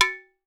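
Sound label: LARGE cowbell, LATIN percussion; Voice Crystal - Steve Reid Definitive Percussion; LATIN SET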